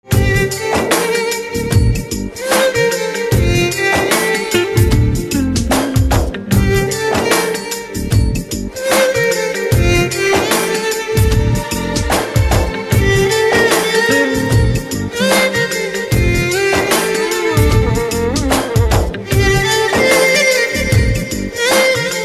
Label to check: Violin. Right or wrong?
Right